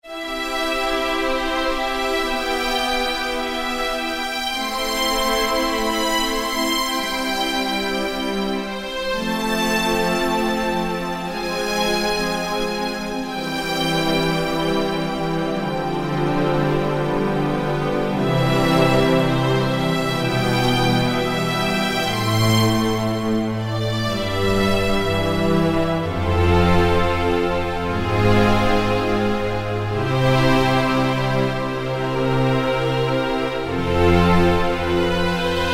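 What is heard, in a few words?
Strings Swell String, Yamaha EX5R; Factory presets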